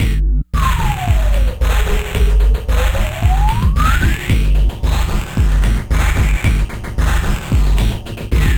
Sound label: Loop; Sonic Foundry (Sony Creative Software); Esoterik Beatz; Warsaw